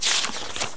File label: book